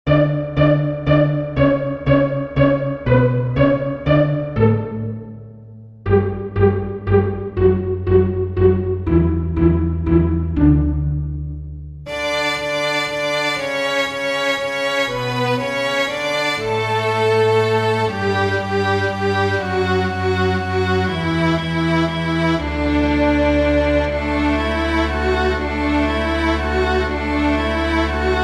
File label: french folk song